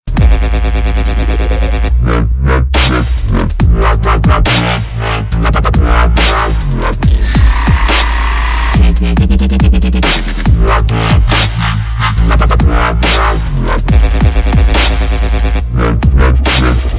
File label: Super Dubstep